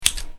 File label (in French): Ciseau simple; bruitage; Electro-menager